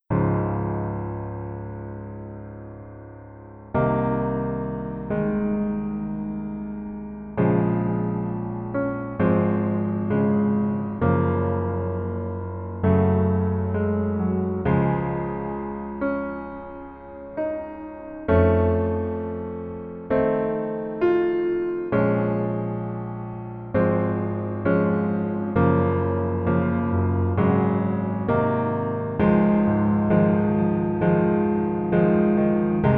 Piano ver 남자키

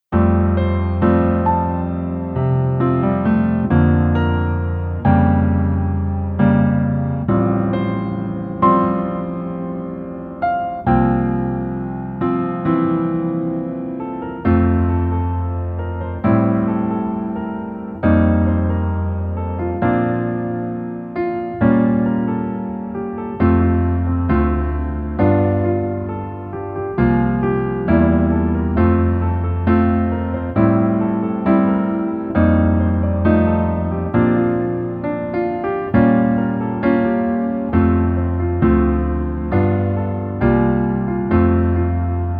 Piano ver 멜로디MR 남자키; 이태원 클라쓰

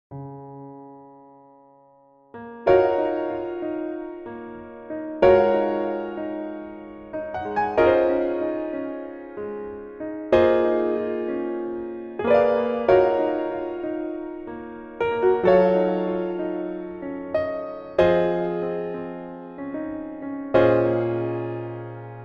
Piano MR, 멜로디MR

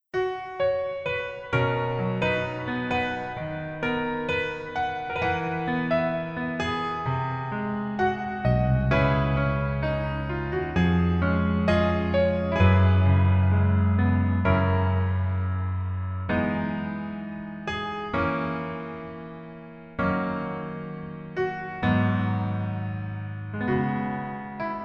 Piano ver -1 키

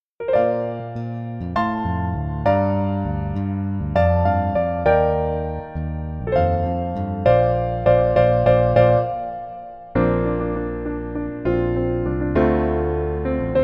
Piano ver 멜로디 MR -1 키

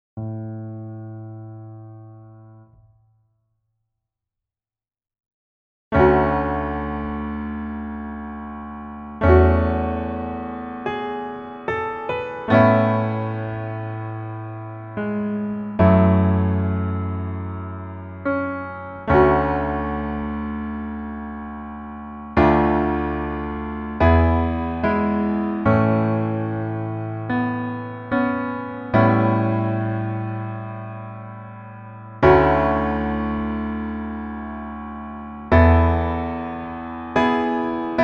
Piano ver -1키

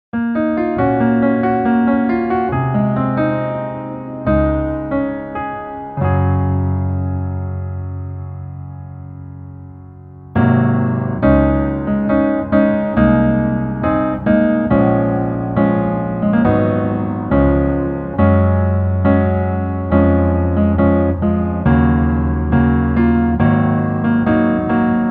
Piano ver, 남자키

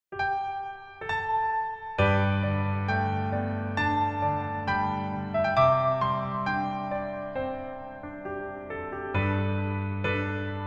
Piano ver, 멜로디MR, 여자키

